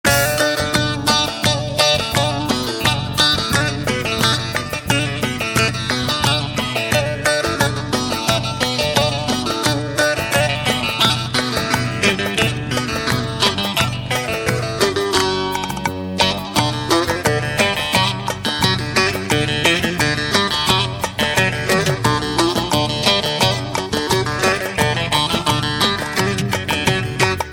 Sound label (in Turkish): ankara misket zil sesi